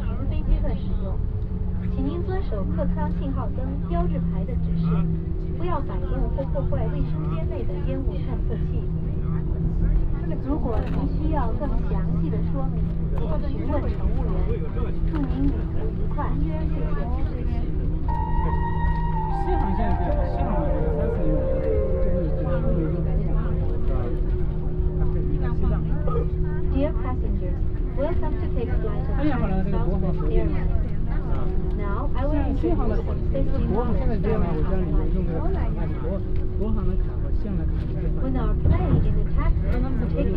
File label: Takeoff China Filtered, Airports Airplanes; Sound Scapes